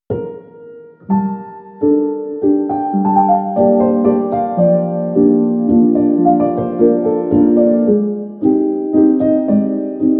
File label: A playful and sweet melody opens this delicate piano cue.; Narrative Mind